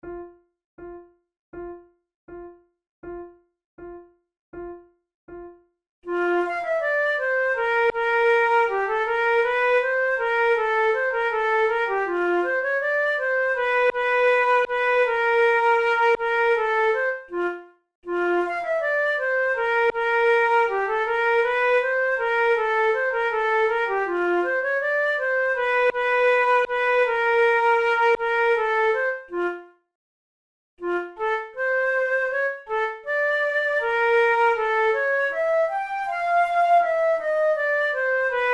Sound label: methode de flute; tulou